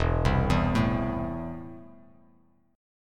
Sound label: E Suspended 4th Sharp 5th, Esus4#5 chord; arpeggiated; JGuitar